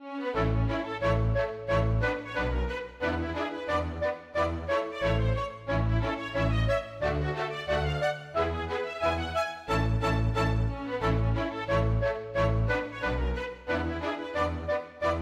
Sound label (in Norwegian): Polka; storgårdsmusikk